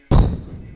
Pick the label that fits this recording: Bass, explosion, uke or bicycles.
explosion